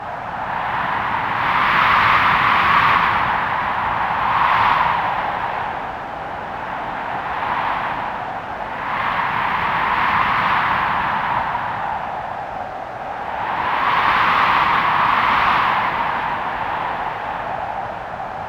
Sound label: Ext Cold Edge Wind Amb; Warframe; Corpus; Exterior